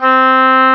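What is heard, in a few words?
C4 soft vibrato, oboe; Northstar - New Gold VOL-2; EBONY OBOE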